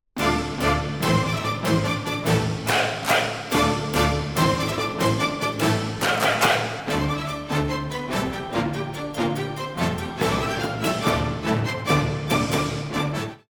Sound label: choose team; music kits custom